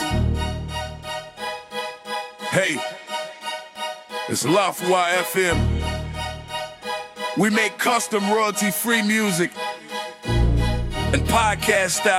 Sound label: HipHop Segment; haha fm